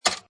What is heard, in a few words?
Pinball Sound Bumper Knock; Video Games